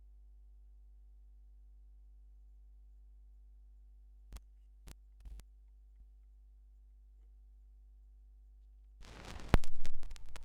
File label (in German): Schallplattengeraeusche; rauschpartikel; Vinylknistern